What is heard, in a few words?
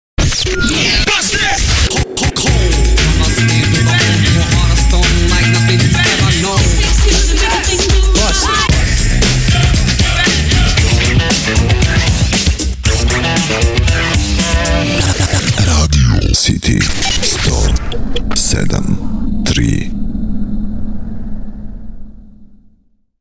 old skool beatmix; city radio